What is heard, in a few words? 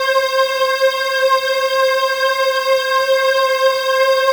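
SEA pad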